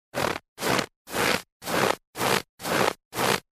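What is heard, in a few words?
shagi moroza sneg, footsteps frost snow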